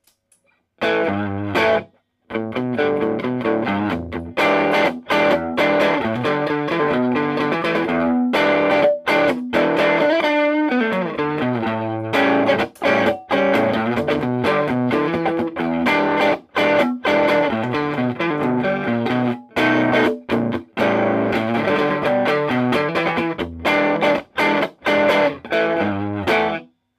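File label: st overdrive; reverb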